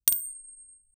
a single crisp coin flip

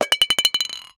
a single plinko ball drop

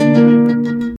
a big beat; beats

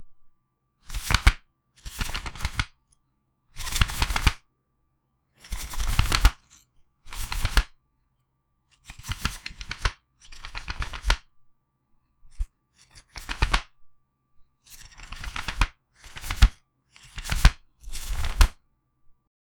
realistic playing cards, tabletop

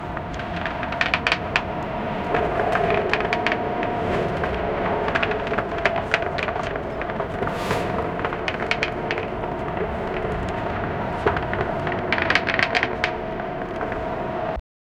ottoman soldiers pulling, watercraft

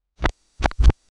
click sound like whoosh

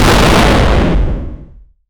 generic explotion sound

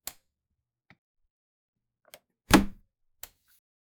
a quick snap of 2, latch

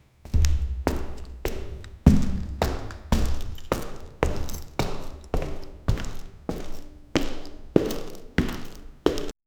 slow heavy footsteps, hall

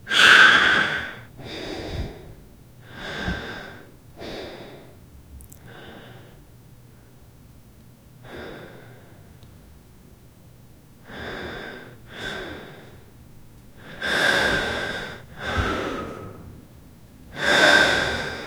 18 years old man holding; mouth closed

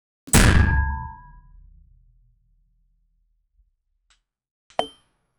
a metal clank

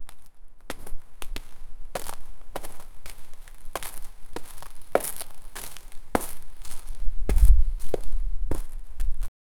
bare feet footsteps

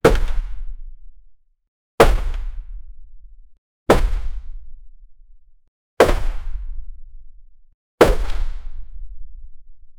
fast wooden object throw; physical

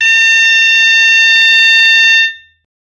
a power sound of forging; forge

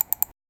hovering your mouse over, button

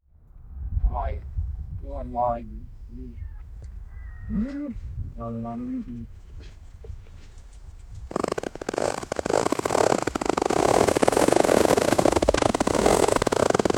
eight reindeer harnessed, sled

